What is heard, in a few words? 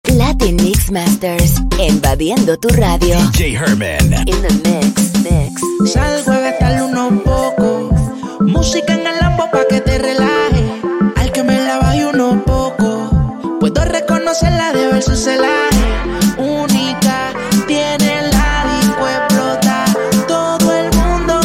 Reggaeton vs Salsa Mix